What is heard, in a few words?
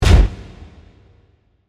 Hit Crunch Reverb; Hit Sound Effects; Sound Design